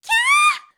きゃー, スポーツ少女

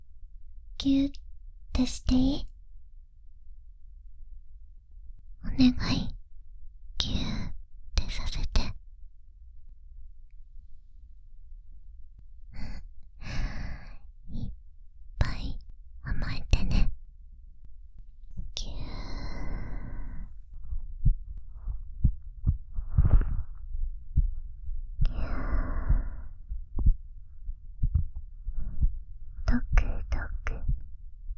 心音; 【心音ぎゅー】いっぱい甘えていんだよ?; お姉ちゃんに耳かきさせて～2人きりの癒しの一日～

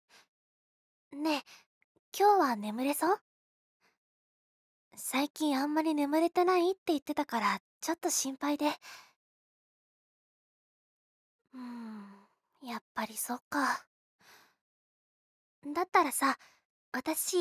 よく眠れるように手のマッサージも 効果音なし, ASMR

